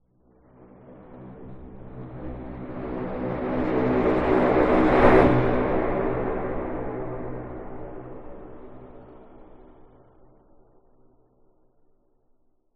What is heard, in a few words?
sound design lfe airy blowing pipe dark big reverb scary